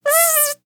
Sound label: Cartoon insect flying